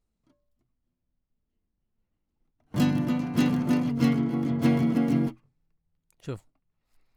Classic Guitar

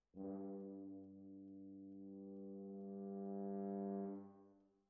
Brass Over

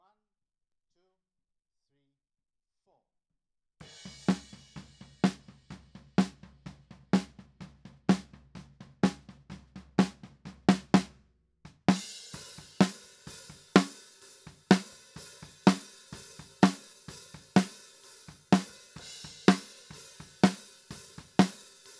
Snare Top

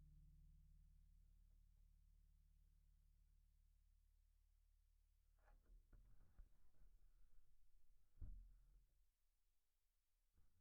Bass DI

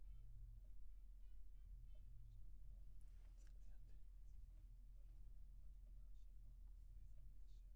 Voc OD

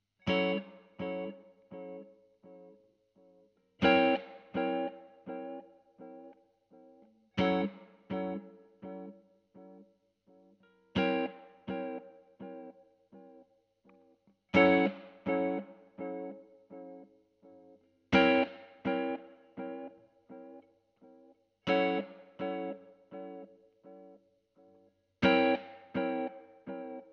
GTR Royer

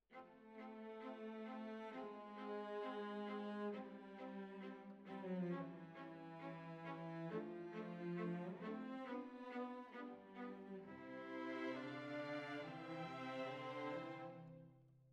Violas Over

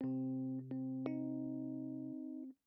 Rhodes

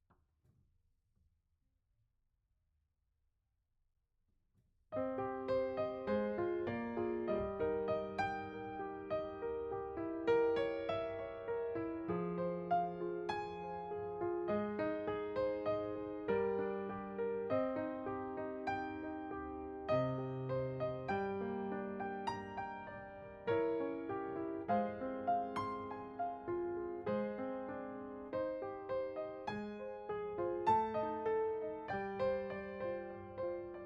Piano B

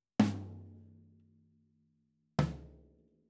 Ride

